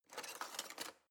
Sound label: knife wood drawer handle rattle